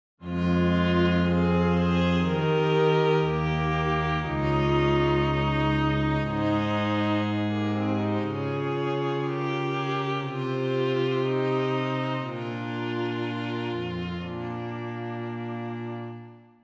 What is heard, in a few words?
low fantasy intro; The Voices of Tyr: Low Fantasy (Intro); An enigmatic string quartet intro for the Voices of Tyr